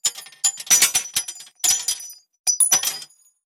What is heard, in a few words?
12mm shells drop single, guns tanks; War Thunder